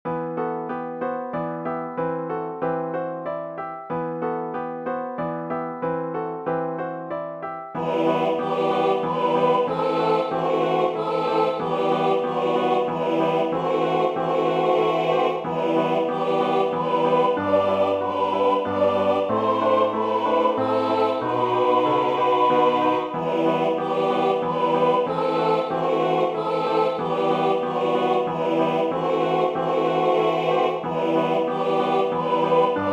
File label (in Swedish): kör o piano; Uppsala